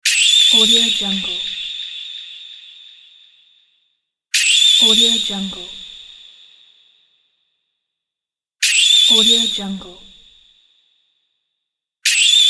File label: japanese manga record win achievement